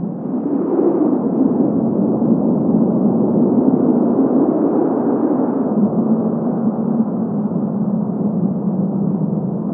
Wind inside

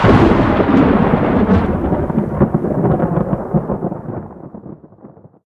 thunder

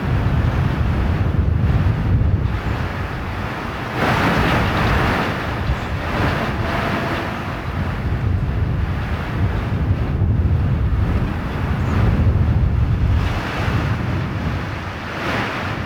Wind outside

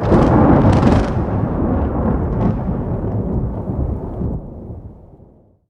thunder; Haunter

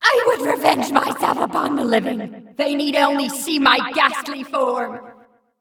moonscream

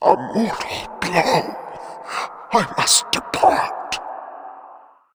whisperwind

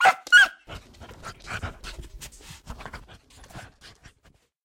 puppy swarm strike; Haunter

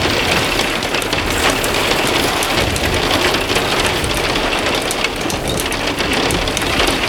hail hard

